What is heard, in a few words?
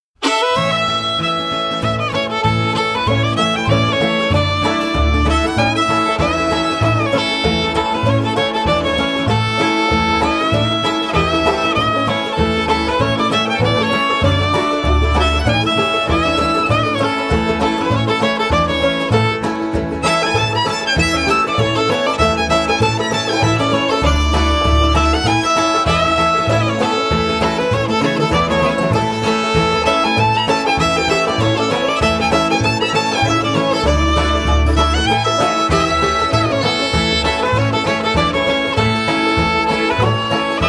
Quail is a Pretty Bird, fiddle